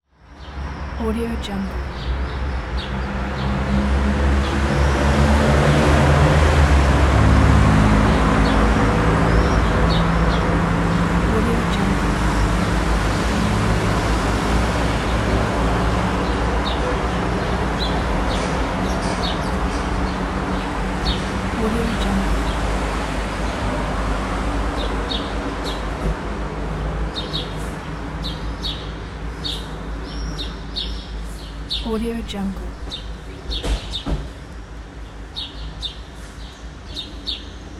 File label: city morning ambience birds traffic